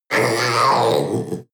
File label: panther young roar short